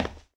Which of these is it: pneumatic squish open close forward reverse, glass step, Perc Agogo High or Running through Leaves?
glass step